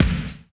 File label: KIK MONDO, Dance Kicks; Roland L-CDX-01